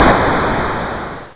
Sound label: Missile Explosion; CompuRoc 2.0.1